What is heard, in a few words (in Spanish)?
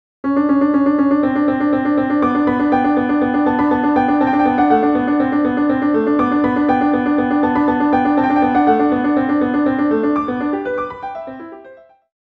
textura figura fondo, Ejemplo de textura heterofónica